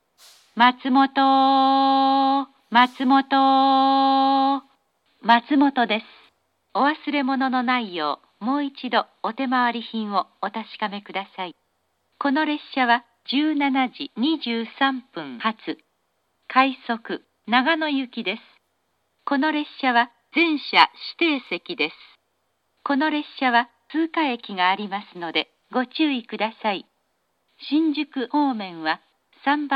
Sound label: matsumoto rapid nagano, station; jr-east